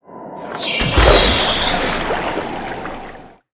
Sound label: Spring wat; Fly For Insanity